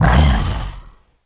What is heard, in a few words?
stone golem death; Crypt of the NecroDancer